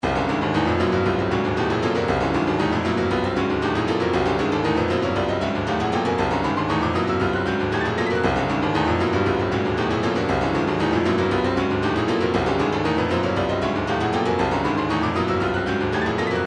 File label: retro funk 117, midi; tear it up